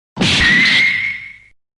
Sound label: Power Punch